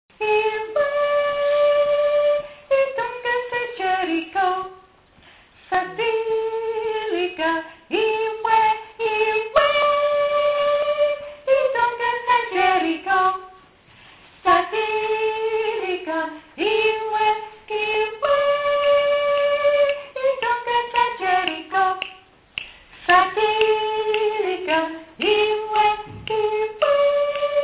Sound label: soprano